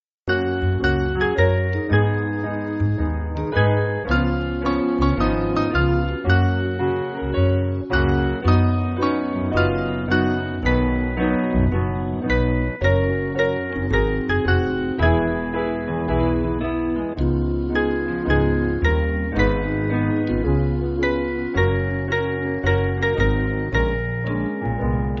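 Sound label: Piano, Free Music Backing Files for Churches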